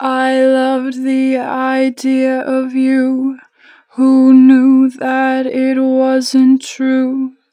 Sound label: Idea Of You, 4 bar vib, Vocals, Natural Vibrato; Harmor Vocals